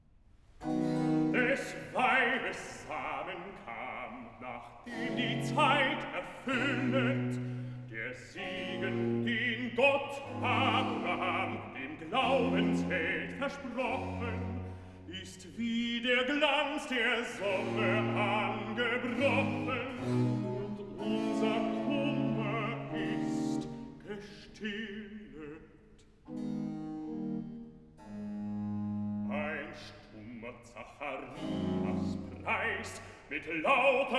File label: Cantata, Recitativo (Bass); BWV 167 'Ihr Menschen, rühmet Gottes Liebe'; Bach; London; Feast of St John